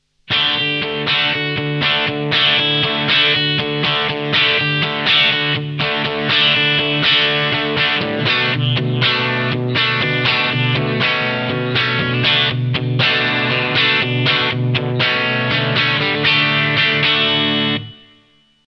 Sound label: Maxon TBO9